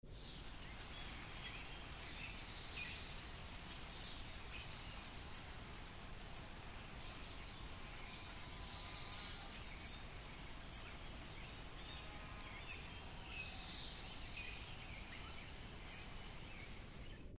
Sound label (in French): bruant chanteur, chant